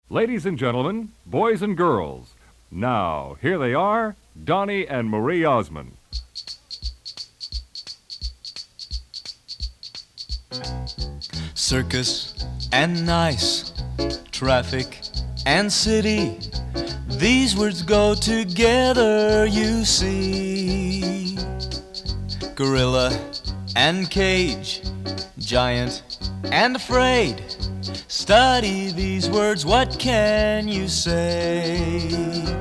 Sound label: Song 3 - Words Like Traffic and City (Consonant Variants); PhonicsSongsPlus, Grade 2